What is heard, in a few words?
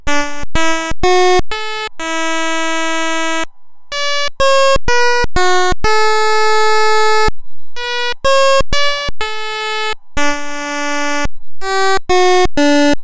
8 melodies pulse